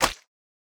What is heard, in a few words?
Squished; Boomerang Fu